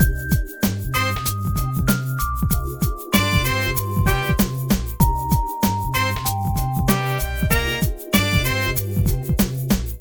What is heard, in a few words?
Loser Ditty